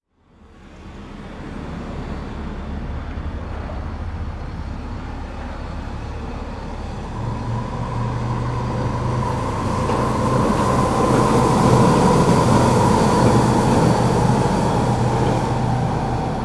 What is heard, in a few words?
Vancouver Noise mono omni